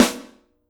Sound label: Snr, drum